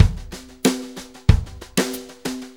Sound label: Brushes Loop, Full Kit Loops; Sonic Foundry (Sony Creative Software); Loops & Samples Drum Tools